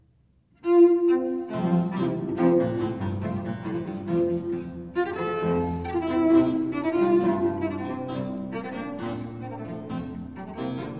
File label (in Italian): Sinfonia In Fa maggiore, Presto, Violoncello e Basso Continuo; GB Pergolesi; Sinfonie and Sonate del settecento italiano per Violoncello e Basso Continuo